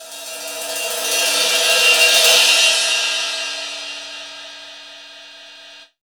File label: drum crash e key, Royality free crash cymbal single hit tuned to the E note. Loudest frequency: 4217Hz; drum sample; one shot